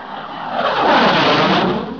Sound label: jet; assault